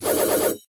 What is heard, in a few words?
roll weapon